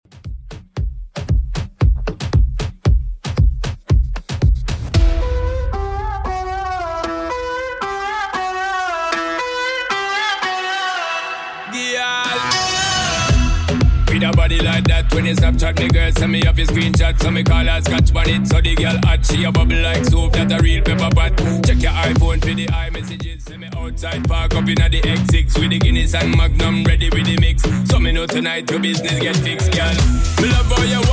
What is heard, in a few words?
Moombahton 115bpm